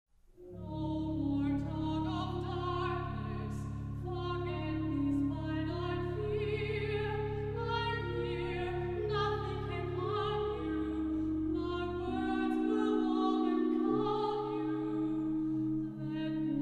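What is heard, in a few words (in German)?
soprano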